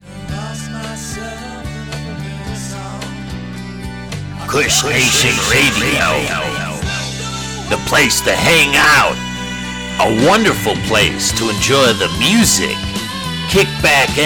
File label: 80s Rock